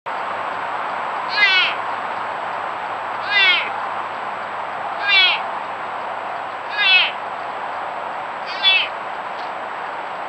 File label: Mule Deer Sounds